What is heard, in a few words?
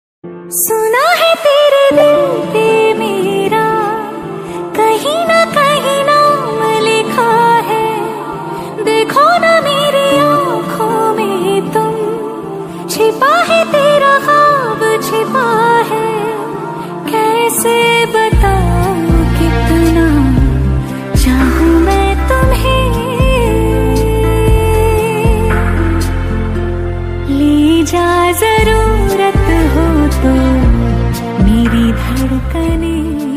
hindi ringtone sad ringtone